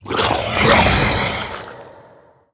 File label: Cyclopian Horror Attack